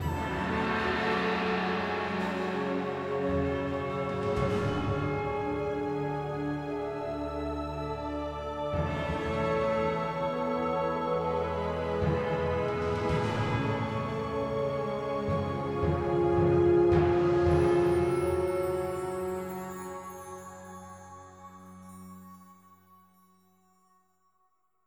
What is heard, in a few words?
Music Solved; adam s venture origins